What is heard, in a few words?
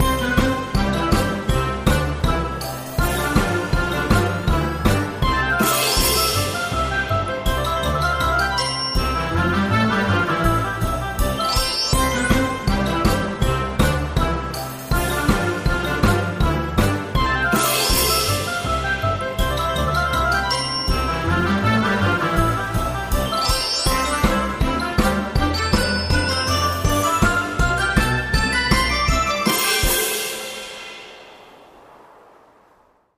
big win; Fishing For Gold